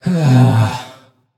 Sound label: nv sigh; smite; xbalanque